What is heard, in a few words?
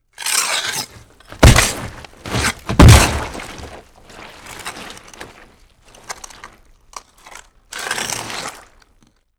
Monster Footsteps Clawing